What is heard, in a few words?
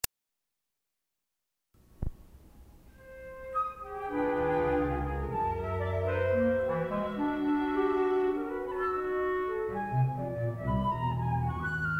Septet 12 Tone